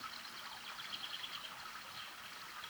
Waterway B Light

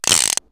Wind Up, perc fx; X-Static Goldmine

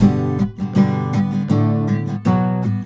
EM GTR, Celtic Flavours; USB Soundscan vol.42; AKAI

